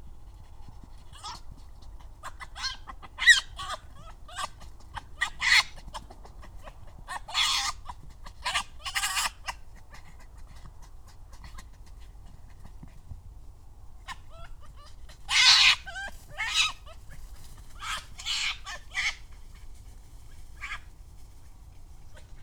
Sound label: red fox vulpes vulpes